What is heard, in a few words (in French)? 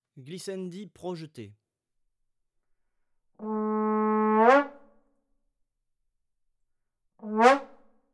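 glissandi projetés, trombone; Effets; Glissades; traite orchestration; trombone tenor trombone basse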